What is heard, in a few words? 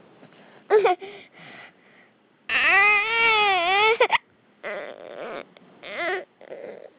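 baby hungry, male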